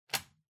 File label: Wall Outlet Rubber Plug Unplug